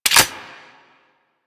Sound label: Grenade launcher Mech; Warframe; Weapons; Corpus